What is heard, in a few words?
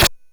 Drums, Hits; DCM8